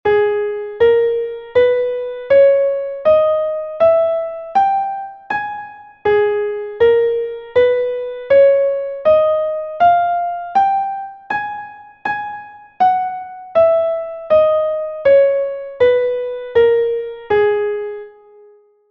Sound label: scale of g sharp harmonic; Essentials of Music Theory: Elementary